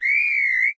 sports question, windows sound schemes; windows 9x

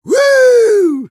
taunt flip fun; engy; taunts

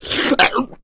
smoker reel tongue in, miss

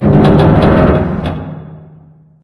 bin creak; video games; ambient; source engine; portal2; outland

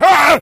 become enraged, infected

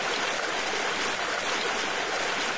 forest water run, ambience; Source Engine; dod

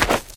sand, footsteps, infected, walk; player; source engine; left 4 dead 2

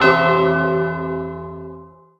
pickup secret; ui; source engine; left4dead2

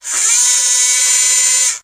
sentry move medium, weapons; tf

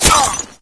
damage, player; Source Engine; dod

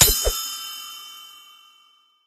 medal click rare

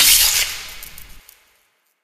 spark, coop electric; Portal 2; Source Engine; video games; coop bot

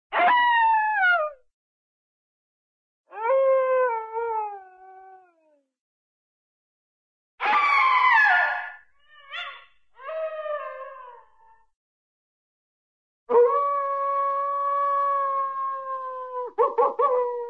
coyote short howl, animal; cartoon

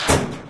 atv ammo close, vehicles; Source Engine; video game

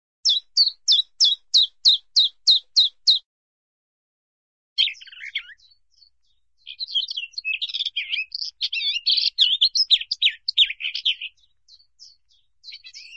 bird, bluebird bluebird chirps, animal; cartoon

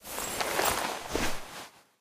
taunt workout get down; video games; source engine; tf

